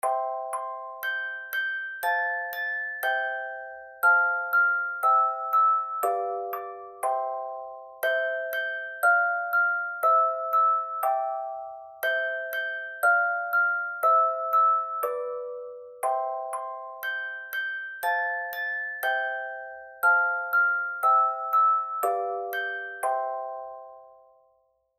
twinkle harmony; songwriting

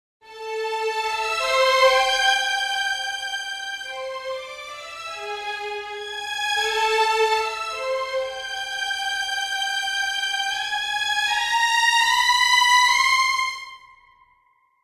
orchestration violins vib; epic orchestral track

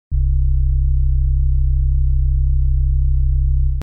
OSC A B C, heartbeat sound; Absynth

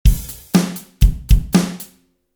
Drum Not Quantized

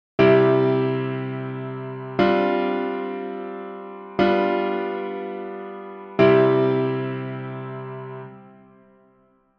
sus4 resolves; altered chords

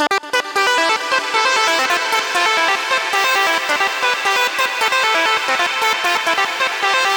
Arp chord; arpeggio pattern; Reason

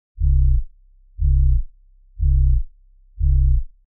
LPF 4 Pole Filter, heartbeat sound; Absynth

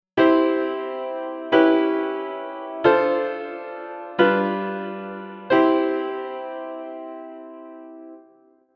I dim ii V; It has an old-fashioned "tin pan alley" kind of sound to it; I've added the seventh, turning the chord into C#m7b5; altered chords

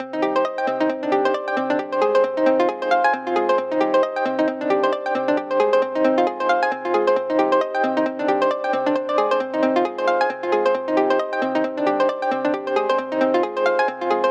arpeggio; Ableton's arpeggiator; progressive trance lead sequence

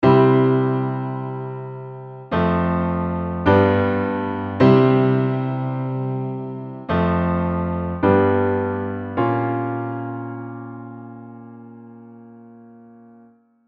Deceptive bvii; cadences

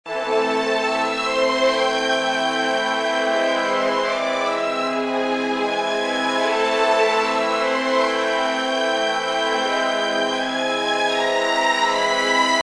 harmony brass end melody, Harmony plus melody; epic orchestral track

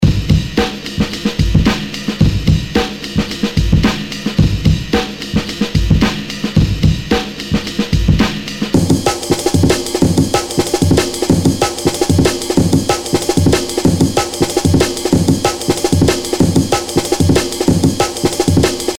Dusty Drums; First at normal speed, and then sped up; break; Techno; Drum and Bass; Dubstep

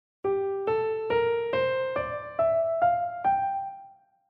G Dorian mode; key signature

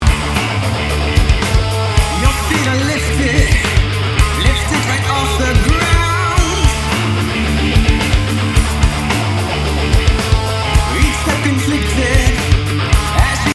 side compression; side-chain compression; rock guitars; vocals

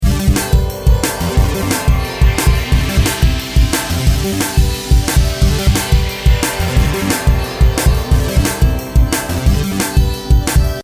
Pitch 178 BPM; tempo shift; varispeed; logic pro 9

